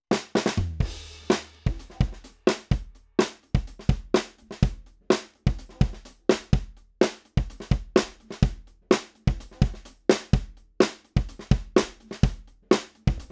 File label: DRUM KIT WURST MIC - AUSTRIAN AUDIO OC18